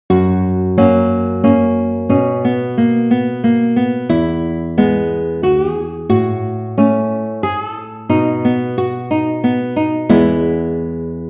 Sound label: waltz; Carcassi, Matteo